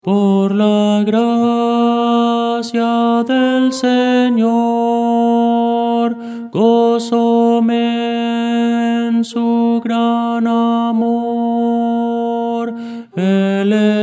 tenor, himnos cristianos

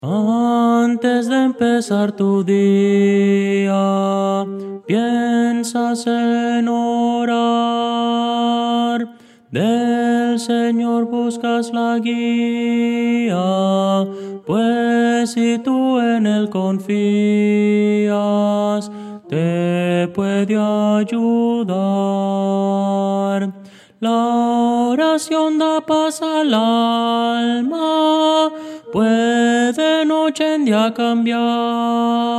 tenor; Voces para coro

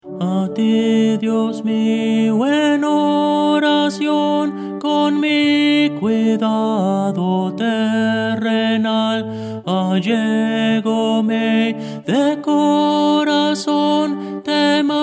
tenor; Voces para coro

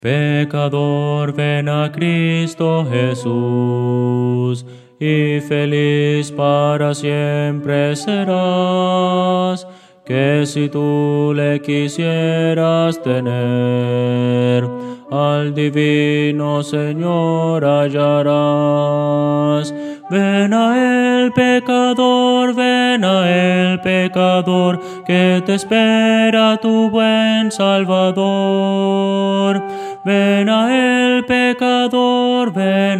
contralto

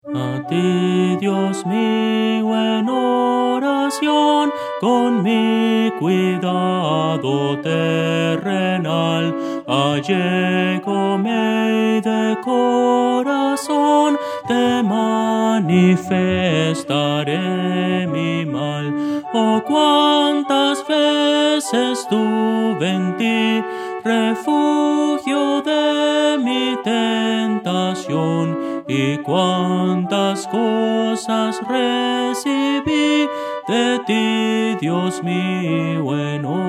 melodia, Órgano

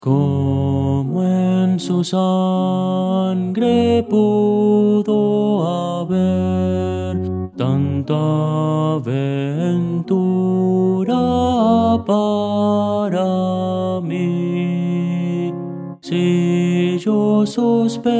tenor

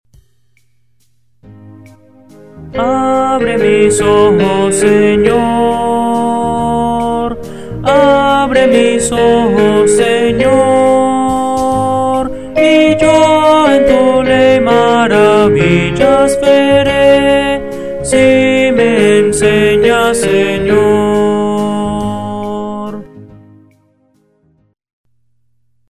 Himnos Cristianos

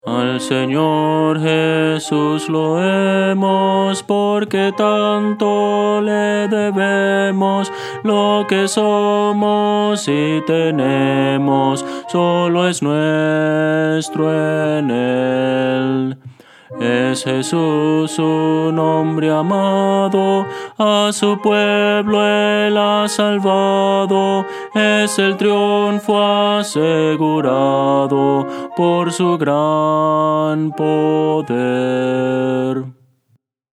contralto

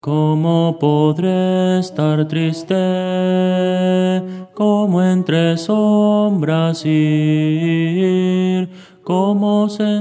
tenor, himnos cristianos; como podre estar triste

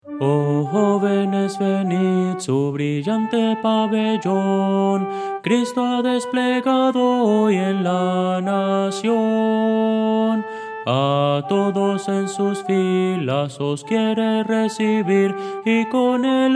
melodia, himnos cristianos; oh jovenes venid

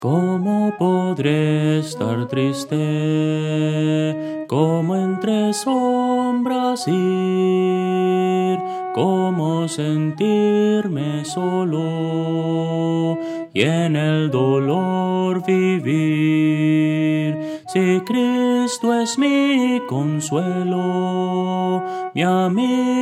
melodia, himnos cristianos; como podre estar triste

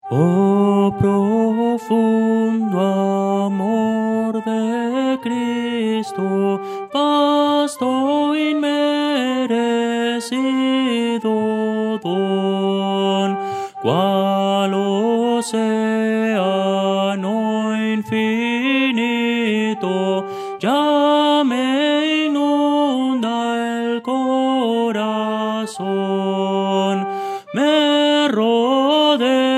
melodia, himnos cristianos; oh profundo amor de cristo

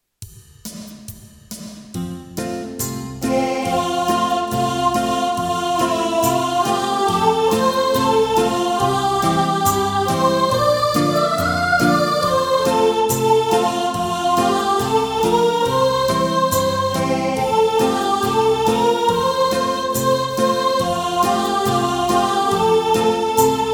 Un ancla tenemos, himnos cristianos

